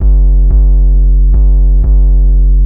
Victory Bass, Drum & Bass; Fluid Dynamics

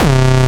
SYN, analog

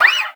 FRET, FINGER MUTE; Xsample VOL-1

